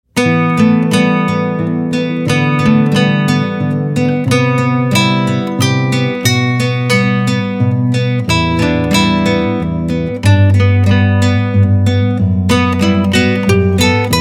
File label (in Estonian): kitarr